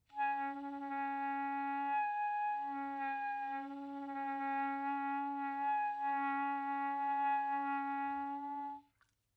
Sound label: noise